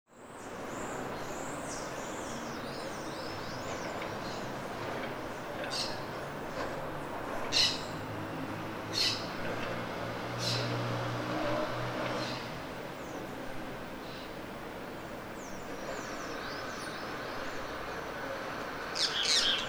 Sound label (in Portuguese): Praça do Gaúcho casa